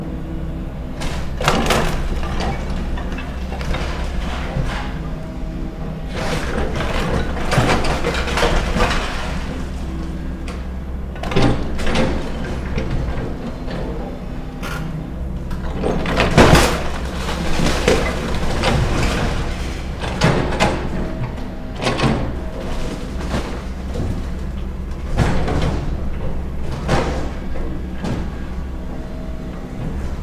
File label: demolish house